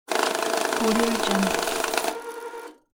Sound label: money counter